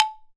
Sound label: Tuned Percussion; Polyend; Simulacrum Sounds; 1 Shots; Acoustic and Electric